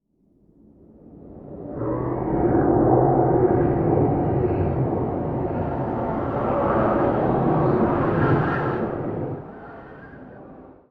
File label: wind dark; anemoi